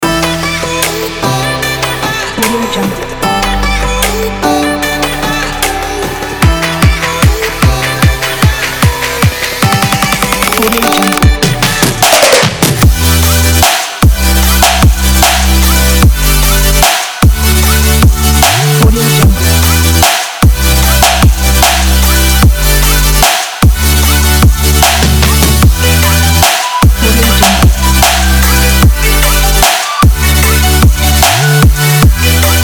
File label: future bass